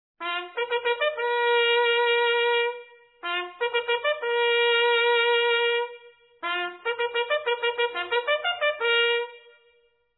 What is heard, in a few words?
Dismiss or No Parade